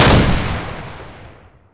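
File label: double barrel; weapons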